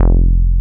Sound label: bass